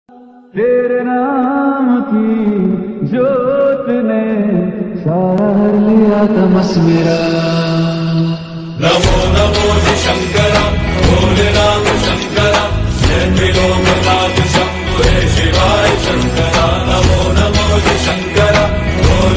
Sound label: lofi bgm ringtone